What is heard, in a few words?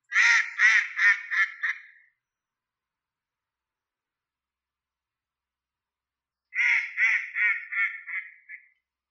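eastern spot-billed duck call; karugamo nakigoe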